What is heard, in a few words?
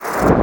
Void Exit; Warframe; Focus; Operators; Operator Wraith Movement